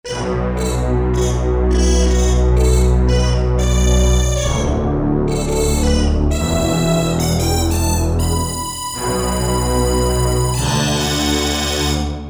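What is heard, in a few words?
Korg MSC-1S Strings Girl Brass; Korg MSC-1S Variety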